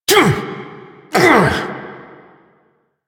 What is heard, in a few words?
Parry Finisher Vocals; Enemies; Finishers; Drifter; Warframe; Duviri; Dax